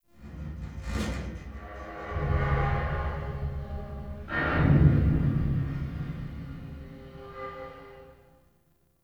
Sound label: Metallic Rumble; Ambience; Warframe; Entrati